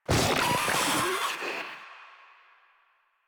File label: Infested Ancient Attack